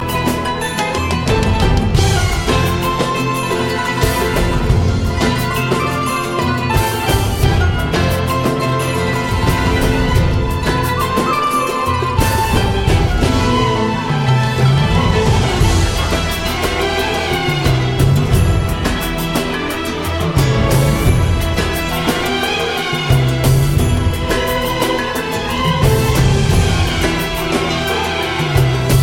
Arabic Music

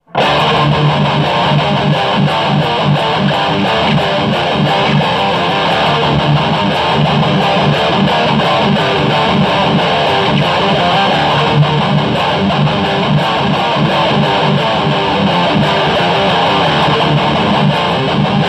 Metal

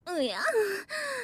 Eep Noise

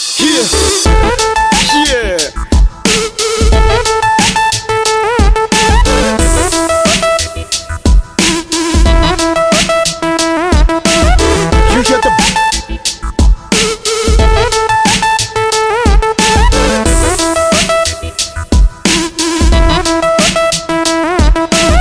Robot rapp drop

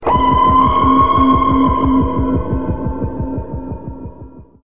Red Alert; Prometheus

